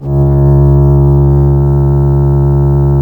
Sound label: C#, strings; Big Fish Audio